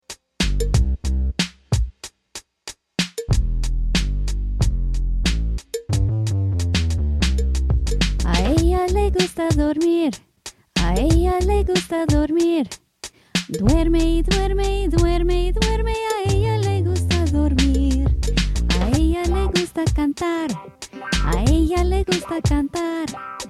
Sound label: le gusta spanish, Spanish Educational Music, Song; (He/She/It Likes)